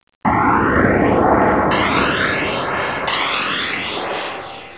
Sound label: Space Effects; Soundsation; Vehicles